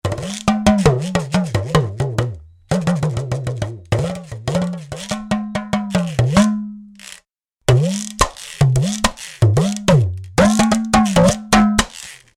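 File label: talking drum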